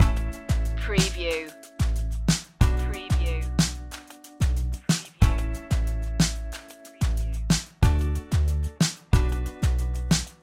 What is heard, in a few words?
calm water loop